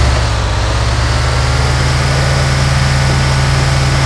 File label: second, vehicles; gmod; tdmcars; gtav; bus